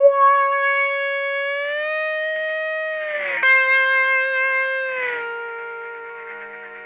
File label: Lap Steel; Trip-Hop